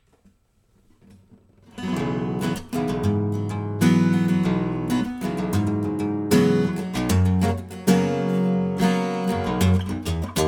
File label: baritone, guitars